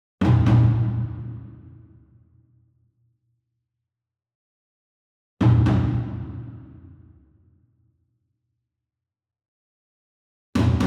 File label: ENSEMBLE DRUM Double Far; boom library; Cinematic Strikes Construction Kit